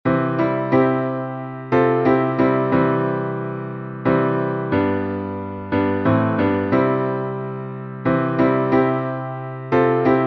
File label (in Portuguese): salmo instrumental